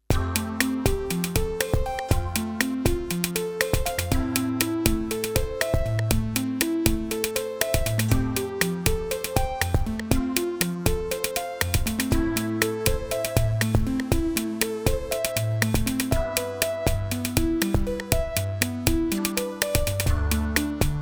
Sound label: Velo Arps; M-Audio Venom; Multi